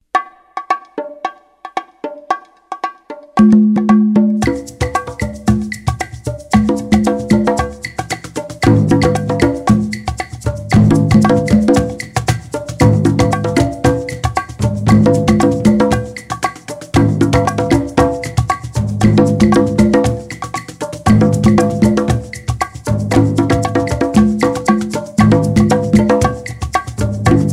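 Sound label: Surdo, Cajon, Bongos, Congas, Clave, Shaker; Timeline: 3 / 2 Clave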